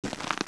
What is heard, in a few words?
Step; Princess and the Pea; SoftKey